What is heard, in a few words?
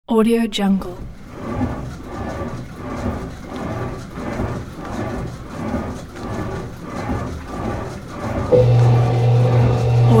elliptical crosstrainer